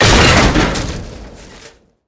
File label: Character Body Impacts; unreal development kit